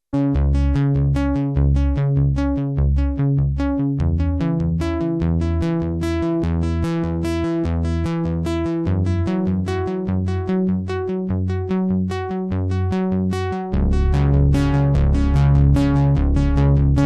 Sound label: arpeggiated